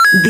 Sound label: retro collect pickup coin